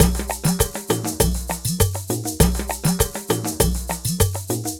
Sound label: Ensemble, Latin Percussion